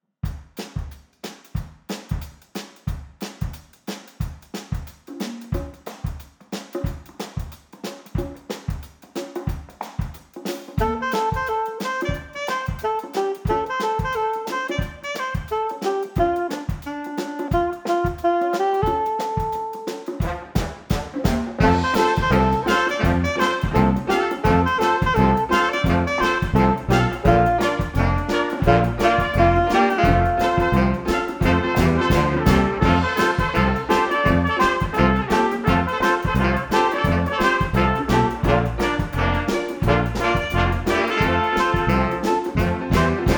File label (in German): Bigband